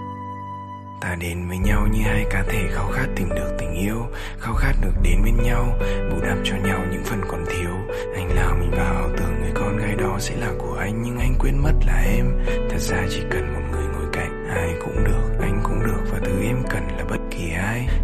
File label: Rap; nhac chuong